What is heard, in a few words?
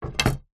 sport pool cue set down on pool table